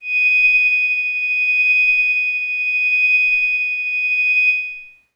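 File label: Glass Howl Kawaii G sharp 6; pads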